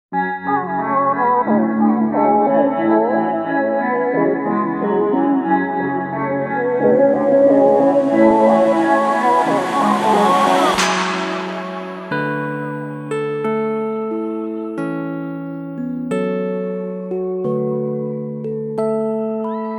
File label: Free Beat